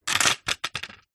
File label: industrial hand tool plastic cheap down on concrete surface